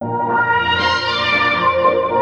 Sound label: ORCHFX, orchestra, Asian Traditions